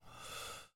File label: breathe in; angband